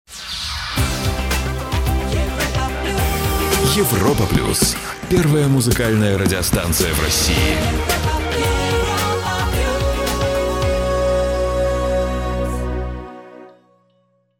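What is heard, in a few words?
hourly station ident; Evropa Plyus Moskva FM 106 20; CHasovaya otbivka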